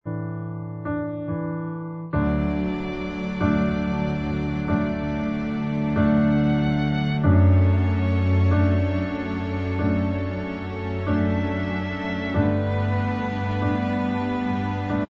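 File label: instrumental, backing track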